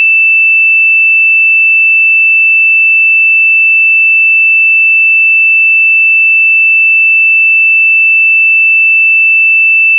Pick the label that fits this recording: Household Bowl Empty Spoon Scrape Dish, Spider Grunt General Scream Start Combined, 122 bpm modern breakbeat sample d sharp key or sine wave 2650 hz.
sine wave 2650 hz